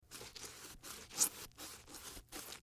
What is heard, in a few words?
MedicalScientific; Soundfx III